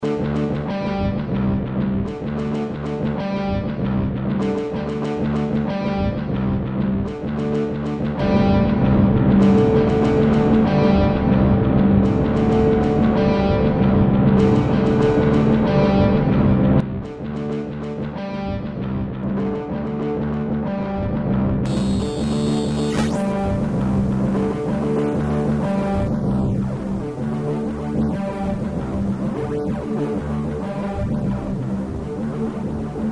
Step Poly Arp; Thumbjam; Magellan MT

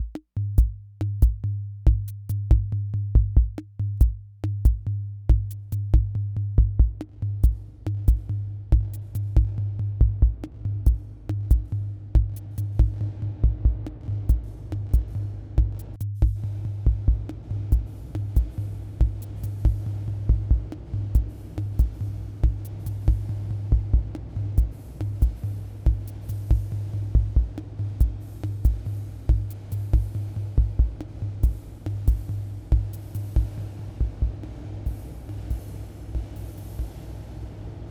Drum Machine Plate; Immerse

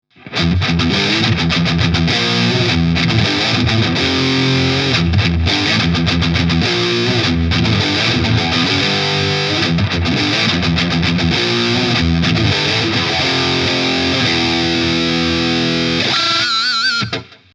Gain 15h Mid Scoop

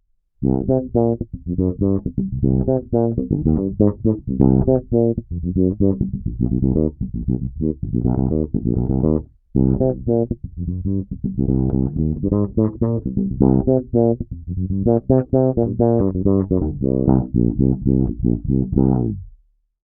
Octafilter neutral full

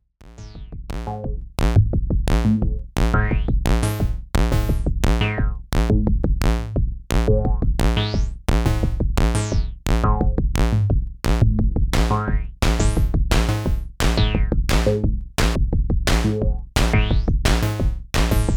Moog Sound Studio Patch; Night Driver

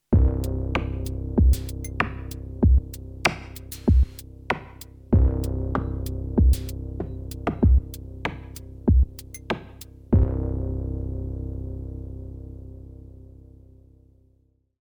digitone

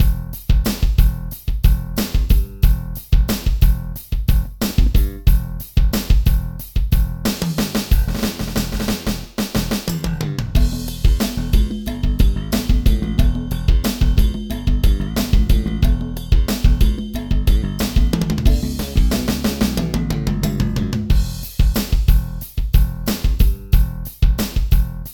SR18 Rock

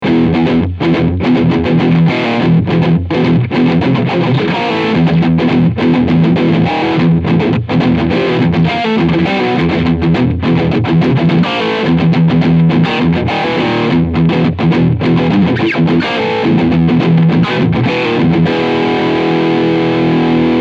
IBZ High Gain

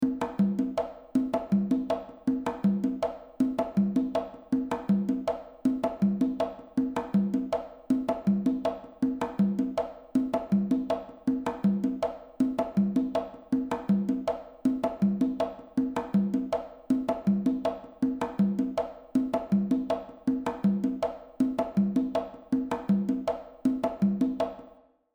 reverb loop Medium Room Drum Plate; Space Designer

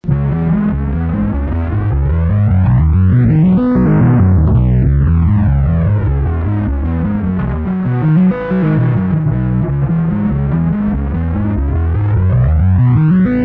lowdown

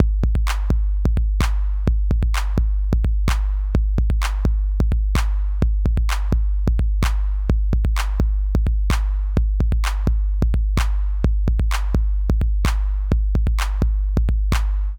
Chandler Limited Zener Bender Software 808; Softube

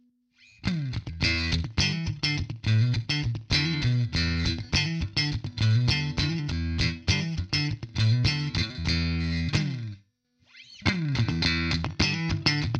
Clean Line

